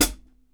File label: FOOT, HI-HATS; Sampleheads; New York City Drumworks VOL-1; KD HI-HATS